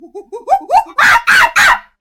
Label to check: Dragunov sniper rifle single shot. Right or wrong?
Wrong